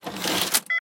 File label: poweron